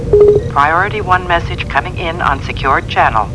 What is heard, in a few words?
priority, computer